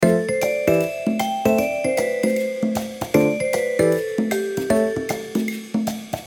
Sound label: Short mistery